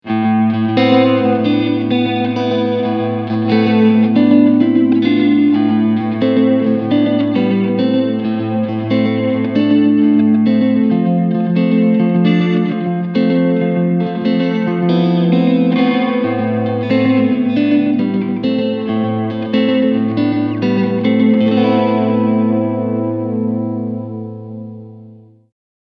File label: Short Delay